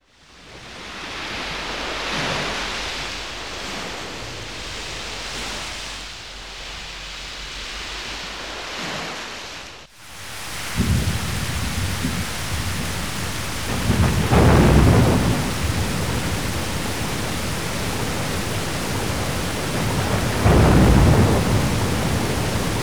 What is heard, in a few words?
Storm; Valkyrie Profile; PSX